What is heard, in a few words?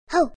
yell; chibi knight